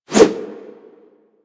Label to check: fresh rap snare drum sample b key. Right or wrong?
Wrong